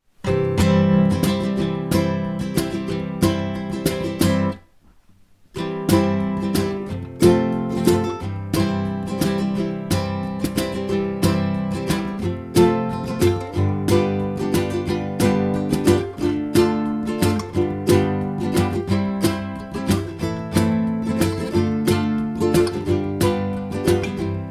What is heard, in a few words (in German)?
Lubolied Playback